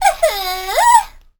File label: Girl Moan